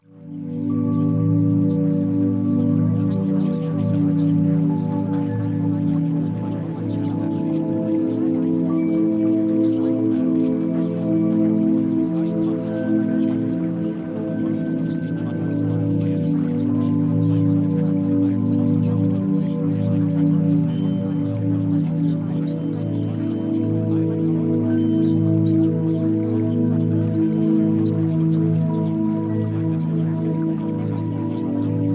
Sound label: Music Sample